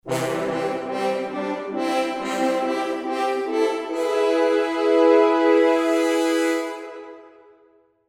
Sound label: Small Victory; CivCity Rome